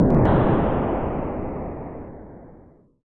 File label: Kaboom!; super trump collection; snes